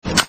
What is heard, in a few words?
weapon; FreeKill